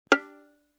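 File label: Violin pizzicato fortissimo on A string F6 stereo; MIS Pitches - 2014; Strings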